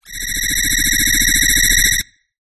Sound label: sound design frequency modulation stuttering vowel tone